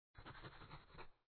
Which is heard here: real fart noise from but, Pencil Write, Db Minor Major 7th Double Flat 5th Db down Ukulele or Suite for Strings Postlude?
Pencil Write